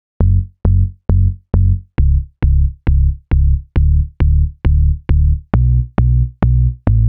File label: 135 Sub; Zero-G Trance Inducer; 90 s Sample CDs